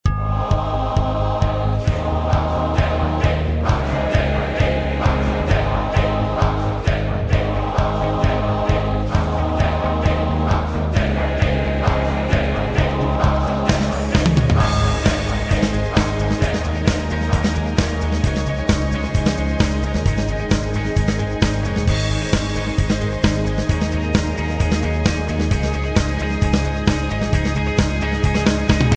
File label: rock chalk chant ringtone